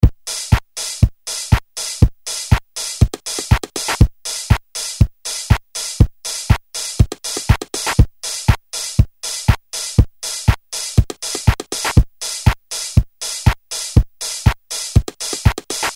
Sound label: House, Korg DDM-110